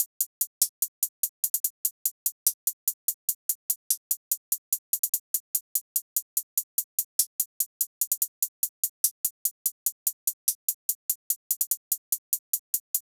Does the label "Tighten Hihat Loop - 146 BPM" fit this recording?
Yes